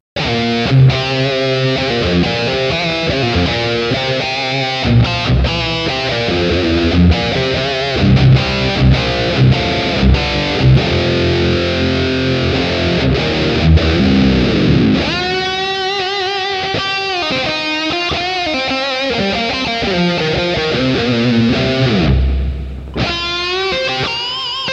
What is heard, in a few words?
drunken guitar